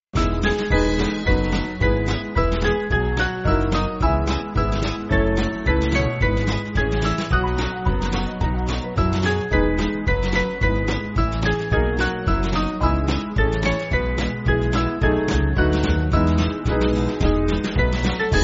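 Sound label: Band, Music Backing Files for Churches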